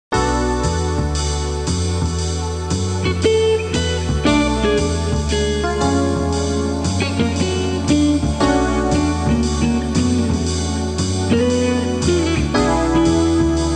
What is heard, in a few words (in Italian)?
musica urban